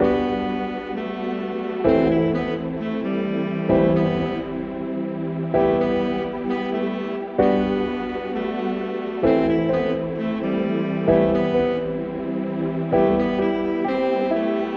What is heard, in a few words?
keys fake saxaphone; Loops; Phonky